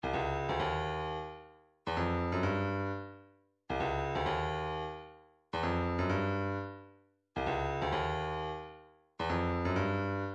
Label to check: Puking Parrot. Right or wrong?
Wrong